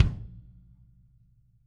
KICK

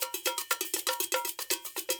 cows 120, percussion loops; Sampleheads - NYC Percussionworks

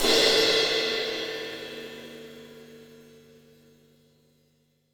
FX CRASH, Drums Acoustic; FX KIT; USB Soundscan vol.10; AKAI; 90s sample CD

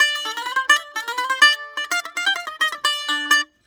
128 BAG, BAGLAM, Mediteranean Traditions; PH BAGLAM

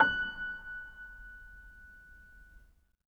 acoustic piano, stereo; InVision Interactive; Miroslav Vitous

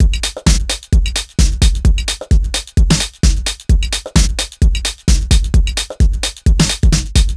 Full Loop 130, Loungin House; Equipped Music

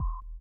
SONAR; Spectrasonics; Metamorphosis

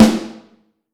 Snare Drum

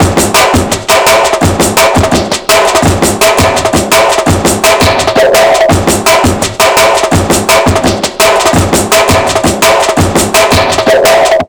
168 break, Jungle; USB Soundscan vol.05; Explosive Jungle; AKAI; 90s sample CD

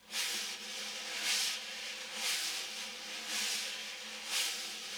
Vintage Snare Drum; 5X14; Q Up Arts; Johnny.C's Vintage Snare Drum

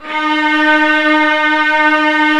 Vla D#3; Multisamples; Digital Sound Factory Virtuoso

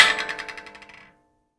Finger Rattle Away, Finger Prepared Kit; The Electronic Music Manuscript Collection; Battery 3 Sample Kits

